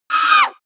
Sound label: wraith attack short; schreie